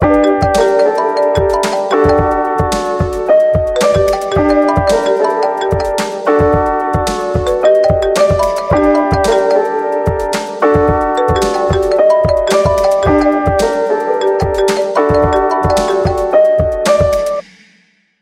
Apple Remix Ringtone

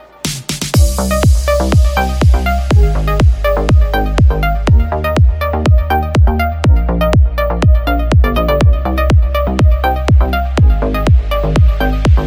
English Music Ringtone

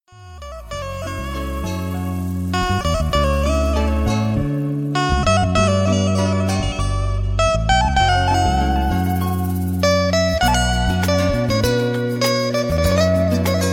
Amazing Guitar Ringtone

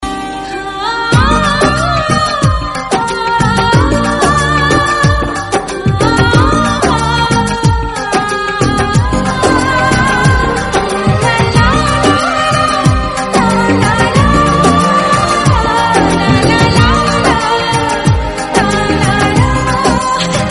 Ringtone Hindi Love

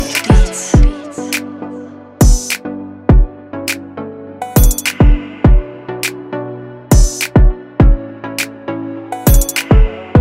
English Music Ringtone

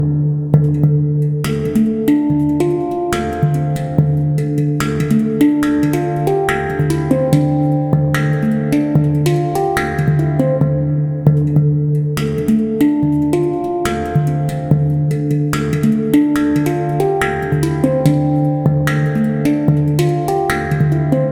Ringtone Honor 9 Lite

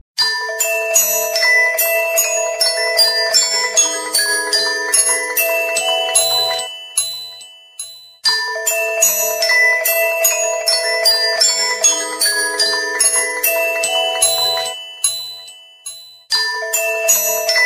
Ringtone; Samsung GALAXY S4 Official

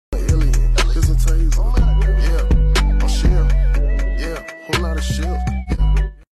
Tiktok rap challenge

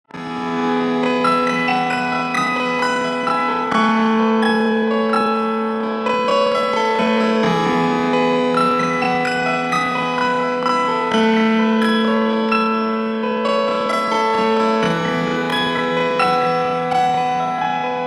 Samsung Galaxy Tab A9 Plus Ringtone

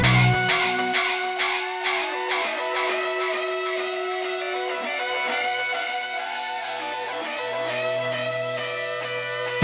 Huawei Default Ringtone

